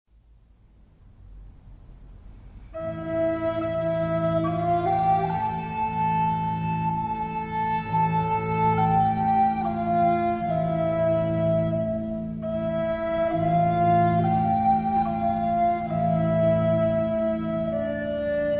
Lullaby; Irish

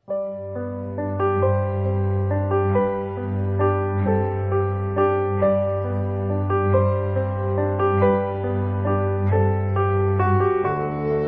Waltz

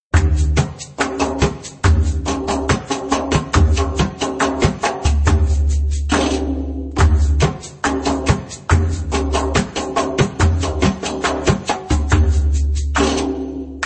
Suppaa Maqsum Short (slow)

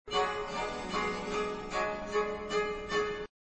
G american baroque; MagnaLoops

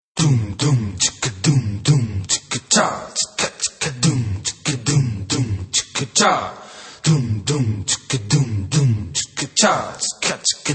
Vocal Saidi (slow)

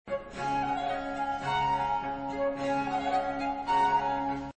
D american baroque; MagnaLoops

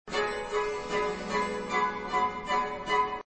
G american baroque; MagnaLoops

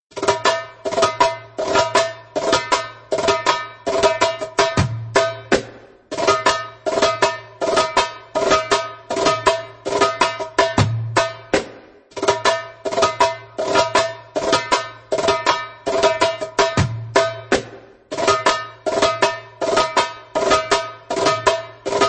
Notorious Fill (slow)

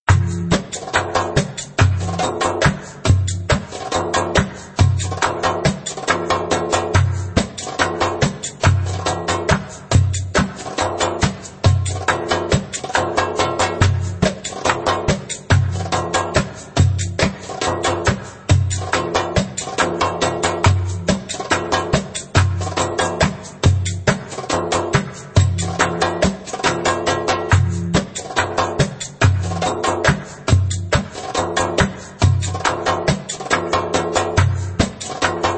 Inverted Maqsum (slow)

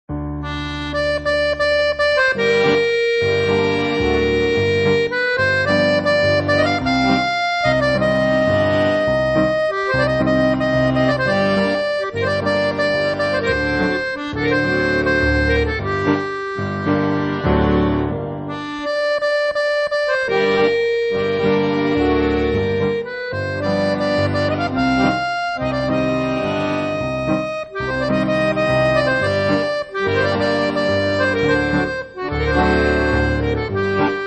Tango

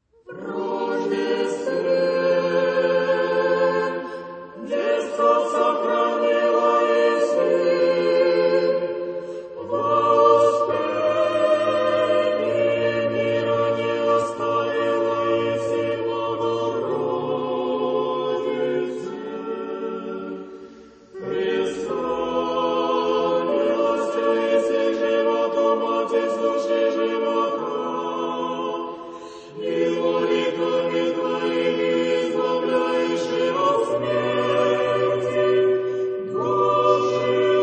Troparion, Church Choir; Putinki Church Choir

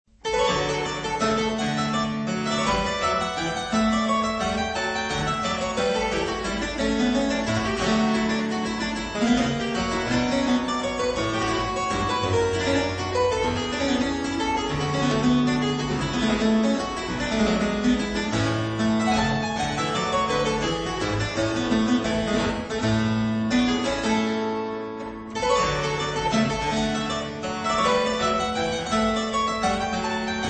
Suite in E Flat Major, Courante; Suite no 6; J Mattheson

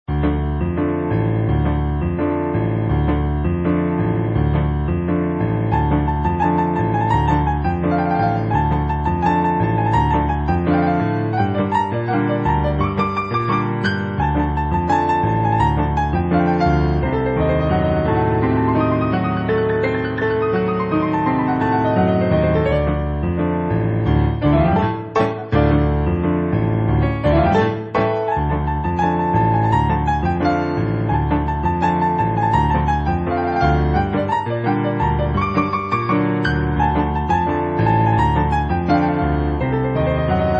Strange Tango

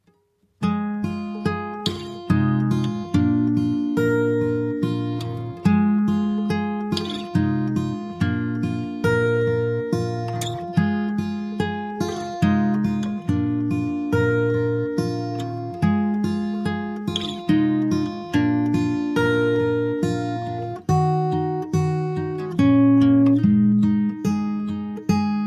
instrumental

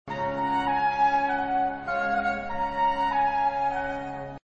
american baroque; MagnaLoops

